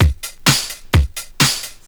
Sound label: Straight Loop 128 BPM, House